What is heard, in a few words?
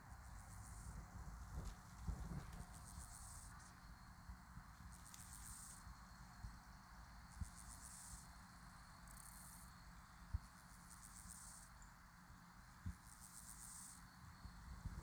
dorsatus